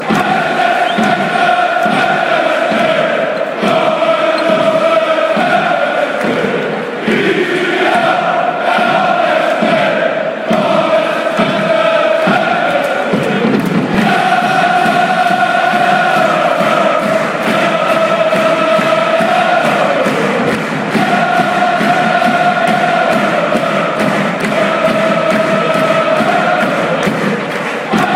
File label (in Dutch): wij zijn fc twente, fan chants